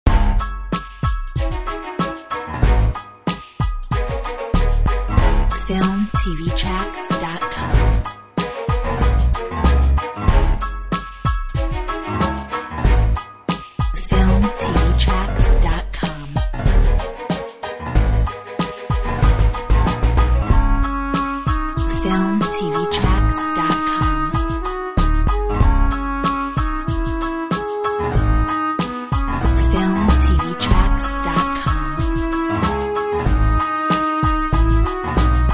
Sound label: Classical Hip hop